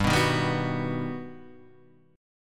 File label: G Augmented Major 7th G down; G+M7 strummed; JGuitar